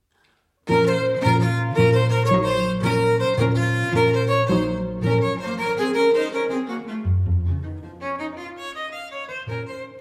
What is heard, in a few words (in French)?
quatuor en si mineur scherzando vivo